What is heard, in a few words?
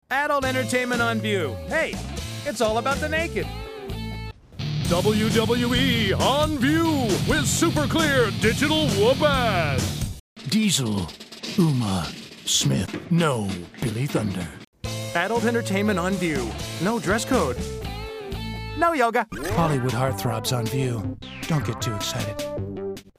bell